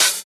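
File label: open hi hat, Individual Hits; neuro; Stanza; Drums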